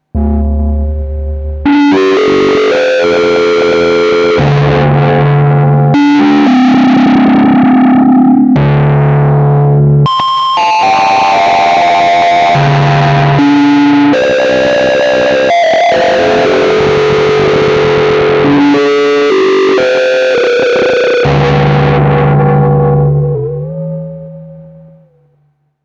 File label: synth sexy drive ring